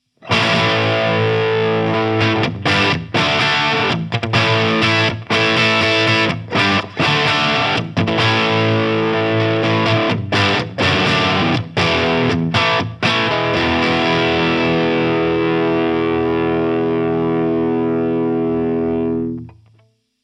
ESP Eclipse QM TEASB Duncan Humbucker Light Crunch